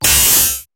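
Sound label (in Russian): имитация пакетом открытого звука барабанных тарелок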